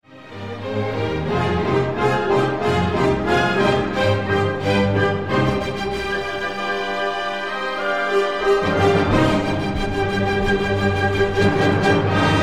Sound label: Symphony 5 1st mvt; Beethoven